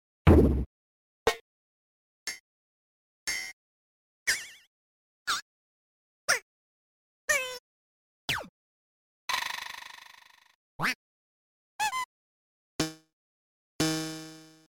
toy Drums Instruments

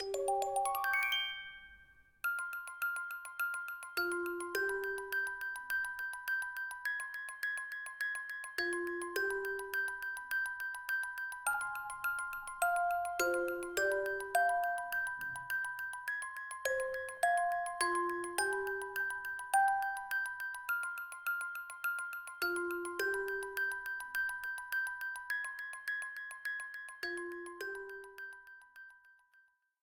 Castle Night; SMM2; SMW

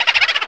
Boo Laugh, Sound effect

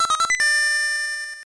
Level Start

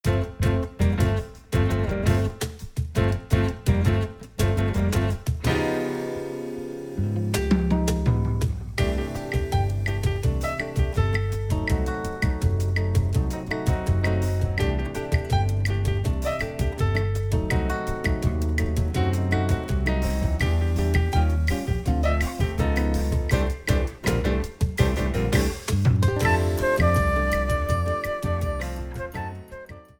Bossa Nova Arrangement

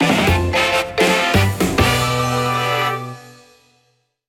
Fanfare; Mario

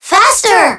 Alarm Clock

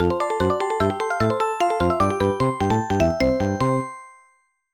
Win, Clearing a level; Coaster Hills

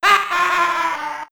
HAHAHAHAAH

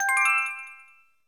Coin Appear High; SMG

